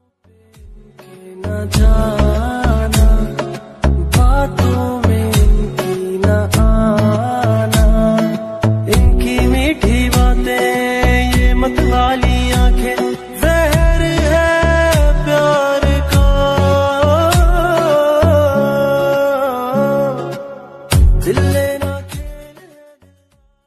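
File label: bollywood guitar music ringtone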